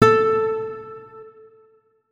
notes, guitar; Hideout Furniture

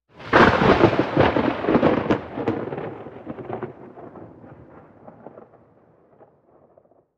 very close, nature; very close2; Atmospherics

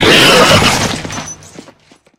flesh death, monsters; Soundscape Overhaul

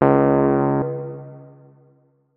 notes, keyboard, electric piano

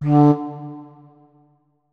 flute notes; Hideout Furniture

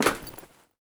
tin, material actor step; Soundscape Overhaul

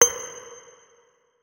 notes, guitar; Hideout Furniture

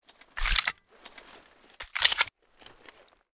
92fs reload, weapons; Pretty Reanimated Pistols

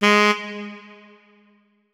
notes, saxophone; Hideout Furniture

